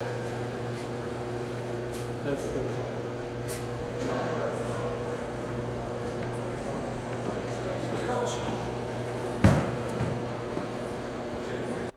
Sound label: reverberant hospital room with footsteps and hum